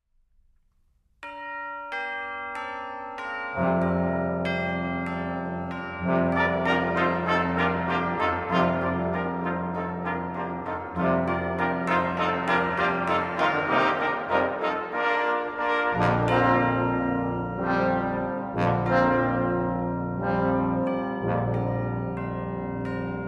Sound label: Ding Dong